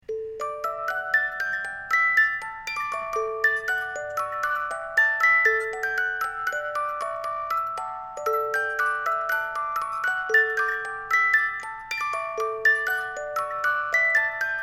Jesu Joy of Mans Desiring Choral of the Cantata, music box